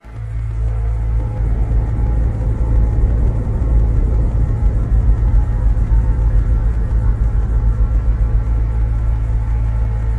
Black Hole Deep Rumblin

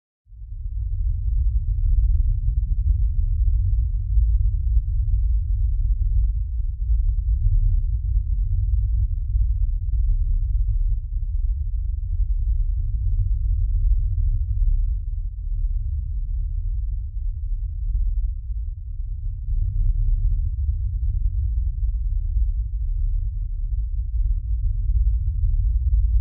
LFE Low Electronic Rumble with LFE

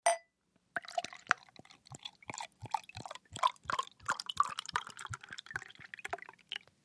Beer Bottle Pour Glas; eating & drinking

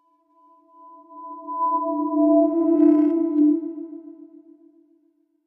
TRANSPORTATION, drones & tones

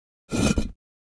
Cinder Block Scraped

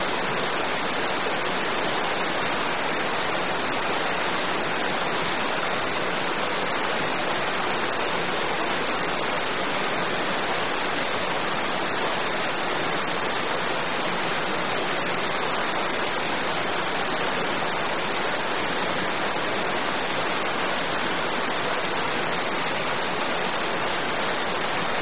River Rapids Waterfa, rivers

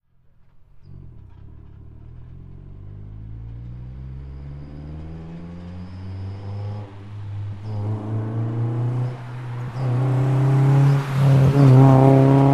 Integra Appr Med Accel, acura integra ext.

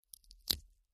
finger break, body

